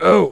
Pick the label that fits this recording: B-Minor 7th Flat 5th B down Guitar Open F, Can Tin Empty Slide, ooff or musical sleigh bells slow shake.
ooff